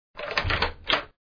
Unlock door

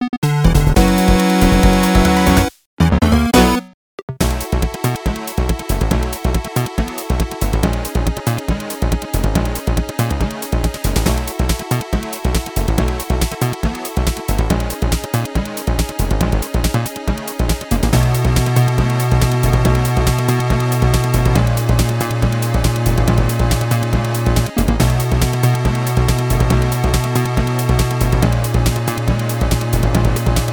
CLEAR PSG; The Adventure of Little Ralph; Fencer

